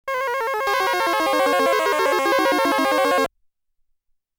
Game Start Music; Phozon; psx

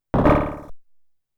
Stomp; Donkey Kong Jr.; arcade

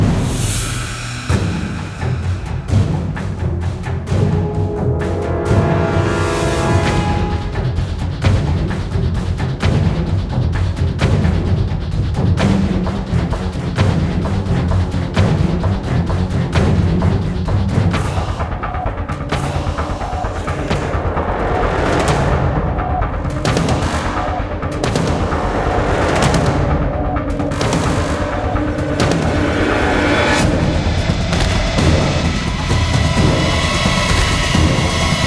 Combat Horror; Singularity

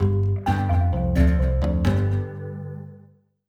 Casual Win

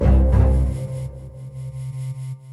Transformation Loop

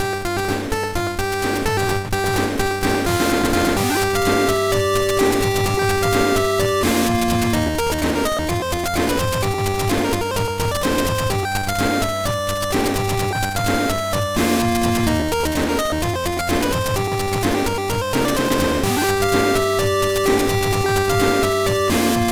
BGM

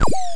Jump

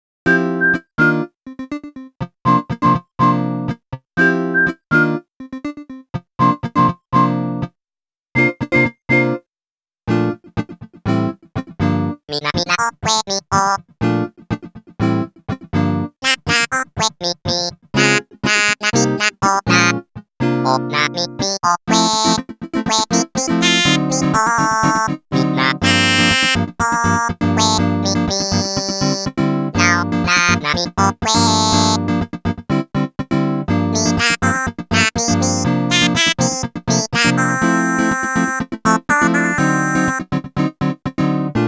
Disco; animal crossing new horizons original soundtrack 2 2022

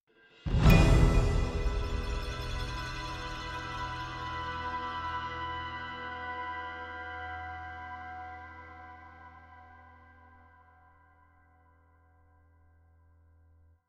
Elevator Fight Outro; weeping Ridge

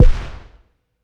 Old Impact Ultra-Puissant; Splash Blast Panic; linux macos ps4 switch windows xbox one gamerip 2018

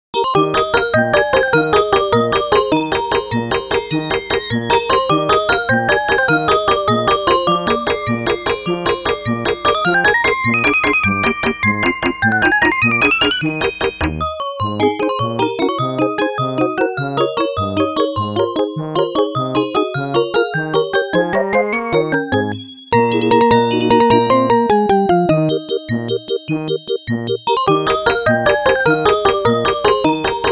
Waltz (Hurry Up)

